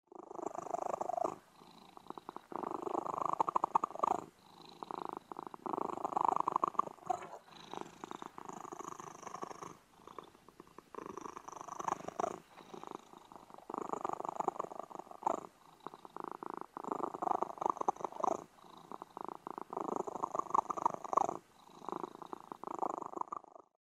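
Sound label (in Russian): мурчание с попискиванием от удовольствия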